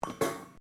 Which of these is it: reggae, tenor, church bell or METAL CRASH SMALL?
METAL CRASH SMALL